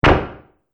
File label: Multimedia Button; Business Programs, Apps And Computing Noises